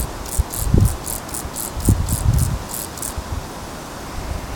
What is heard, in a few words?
Chorthippus brunneus; Trzebiszewo; OrzechowskiR